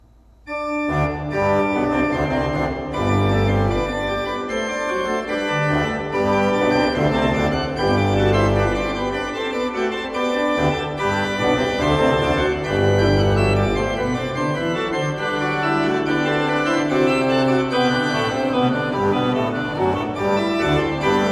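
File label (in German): Verschueren 20 II P, pfeifenorgeln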